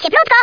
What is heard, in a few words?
fireball; Polish